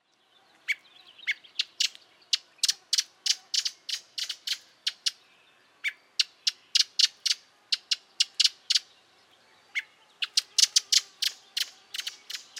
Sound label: alarm; a.celmins